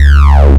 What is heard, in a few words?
HARSH